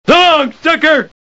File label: death; hl2dm